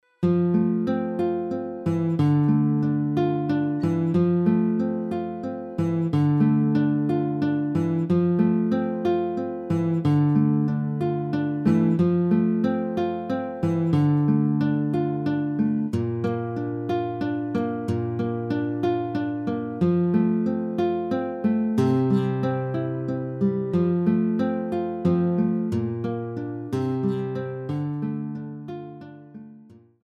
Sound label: Karaoke Version